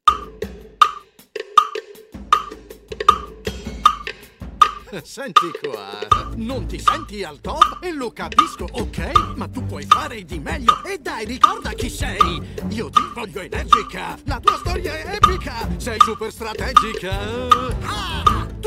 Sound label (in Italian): Am 79bpm, batteria; Oceania 2; Colonna Sonora Originale; 440hz; batteria di brani appartenenti a film cartoni animati serie tv